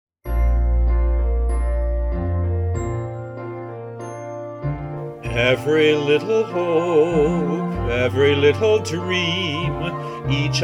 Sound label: Vocal